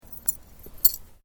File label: KEYS CLINK; Clinks; Objects